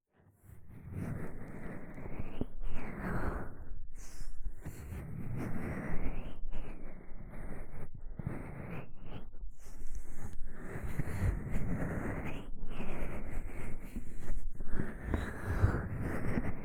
耳マッサージ 左

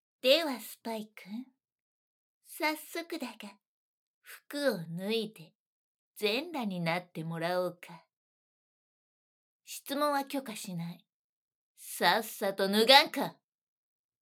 ツバ吐きかけ＆顔面踏みつけ

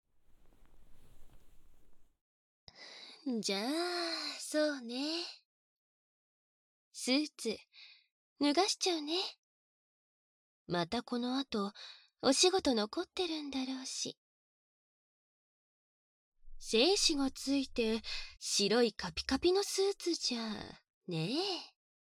【くちゅ音無し】 耳舐め 手コキ

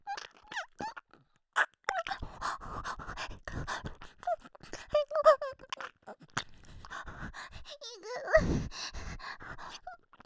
イクイク 耳舐め

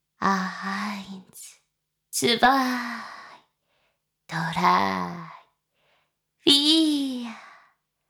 しこしこ音声; 少尉; 牧場戦腺

